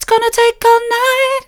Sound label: its gonna take all night soft, vocal